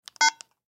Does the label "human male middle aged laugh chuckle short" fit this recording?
No